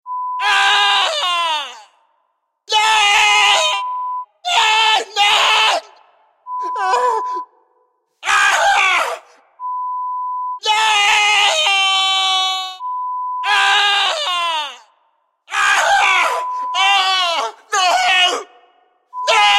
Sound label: Tortured Soul; Horror Sound Effect; Lo-Fi